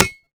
iron small

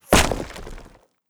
concrete impact bullet; Rust

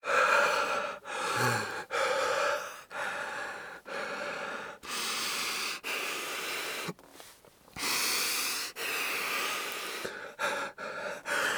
breath hurt; Escape from Tarkov; scav4

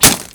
foliage bullet impact; Rust

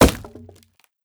wood; Rust

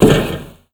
foot tin roof, footsteps; Call of Duty Modern Warfare

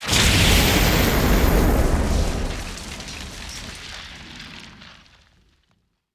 explosion material ground; War Thunder

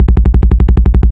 loop sgmt bass, guns tanks

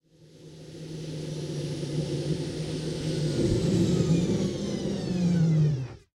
apache engine stop, tanks; War Thunder

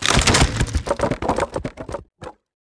fence beam; World of Tanks Blitz

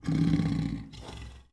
Panther Heal Vox; Civ4 warlords

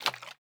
water bottle slosh fast; Rust

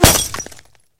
hit cockpit glass

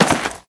land dirt big, footsteps

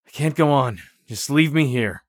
tired; Escape from Tarkov

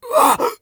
hit; Escape from Tarkov

